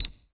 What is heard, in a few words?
Beep